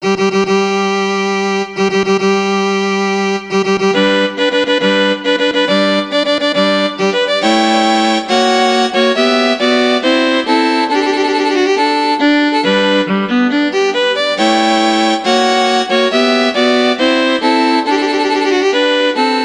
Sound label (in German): violine solo; hochzeitsmarsch mendelssohn